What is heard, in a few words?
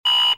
zone hit; lego